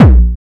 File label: hard bass drumz